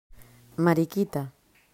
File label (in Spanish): mariquita